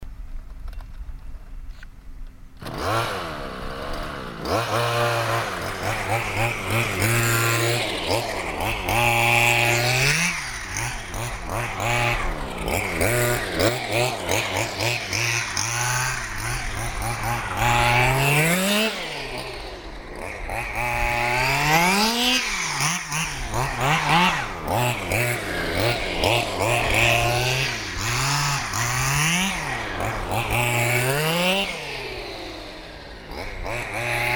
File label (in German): marder